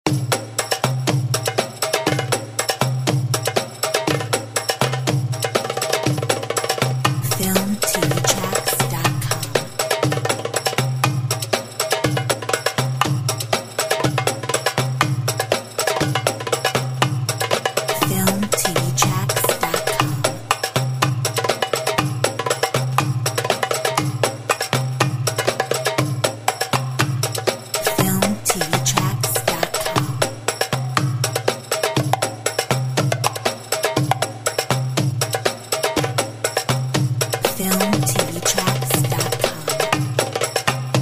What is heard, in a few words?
Moroccan dance